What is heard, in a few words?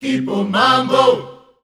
C 120, Voices Of Africa Sung Phrases; Kipo Mambo